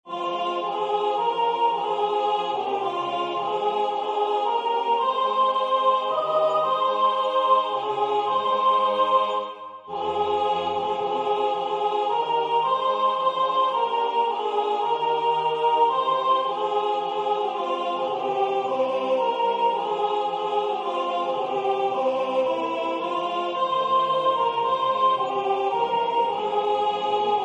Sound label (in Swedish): Folkvisa från Gotland sopran